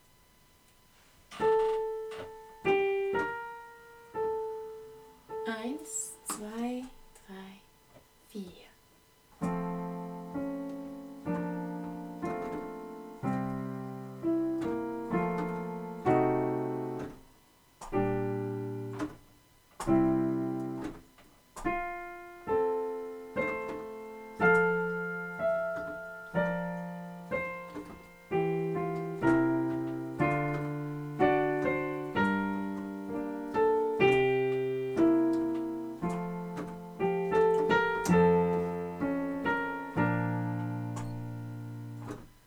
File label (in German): solo